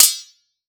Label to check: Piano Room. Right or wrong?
Wrong